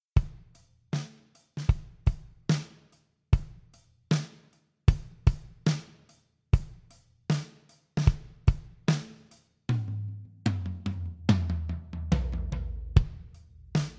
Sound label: black kit dry